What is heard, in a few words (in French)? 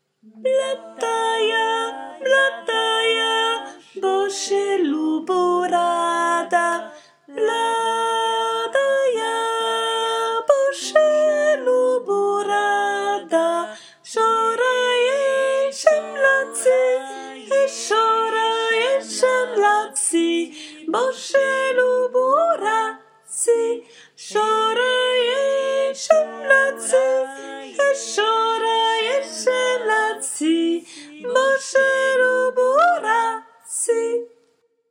Pisnicka voix Soprane